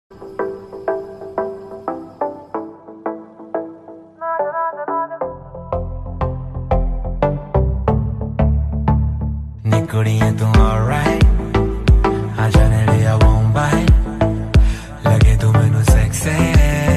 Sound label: romantic ringtones